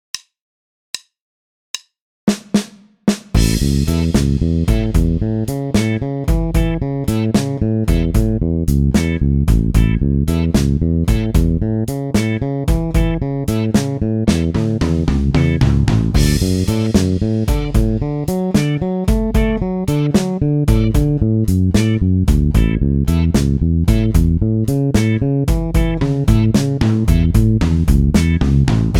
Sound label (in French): blues en la; exercices; arpeges